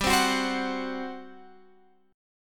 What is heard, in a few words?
G Major 7th Suspended 4th, Guitar, Open F; GM7sus4; strummed; x,14,12,13,15,13